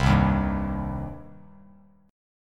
C Minor C down Bass Standard; strummed; JGuitar

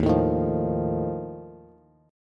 Eb Suspended 2nd Flat 5th Eb Bass; Ebsus2b5 strummed; JGuitar

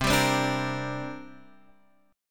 C 7th Suspended 2nd, Guitar, Half Step Down

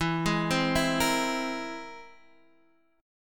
C Augmented 7th E

